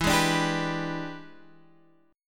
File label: E 7th Sharp 9th, chord; E7#9 Chord {X 7 6 7 8 7} strummed